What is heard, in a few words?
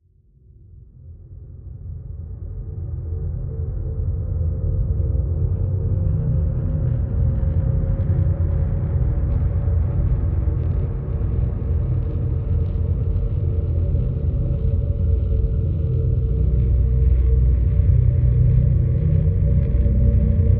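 drone B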